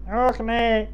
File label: death; gusanos promode